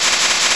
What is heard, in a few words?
move; ROCKSTRIKER